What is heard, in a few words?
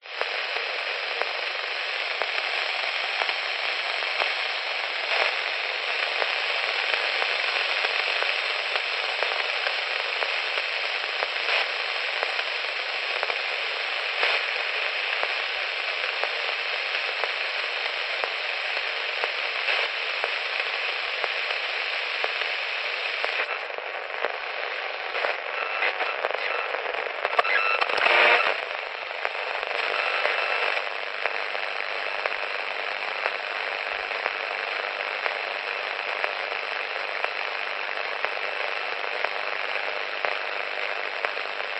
technology radio weak signal clicks buzz static